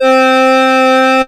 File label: Yamaha TX81Z Accordion C4